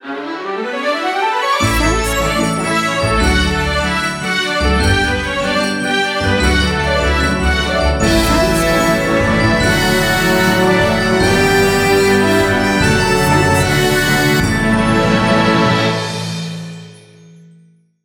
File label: Christmas is Coming Short